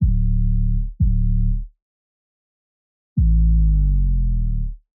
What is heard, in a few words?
Trap City 808